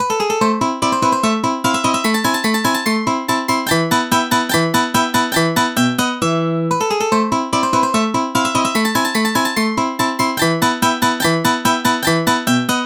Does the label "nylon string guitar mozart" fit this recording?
Yes